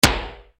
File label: PONG PANG, Yamaha RY-30